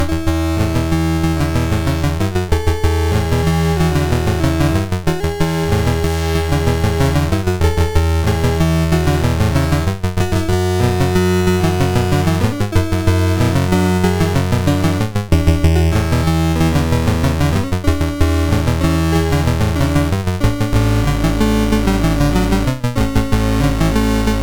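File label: Magicians Ball, MOS 6581 SID chip music, games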